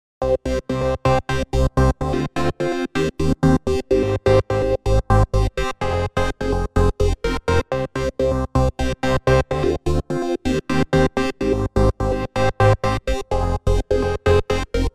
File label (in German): 1Ton Loop